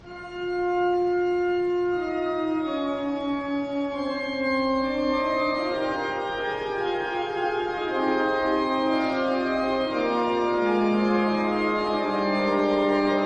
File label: Organ interlude